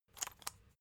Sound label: salt mill metallic soft; berufe; gastronomie